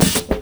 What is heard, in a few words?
hip hop pickup; Sonic Foundry (Sony Creative Software); Ugly Remnants VOL-2; Beatstuff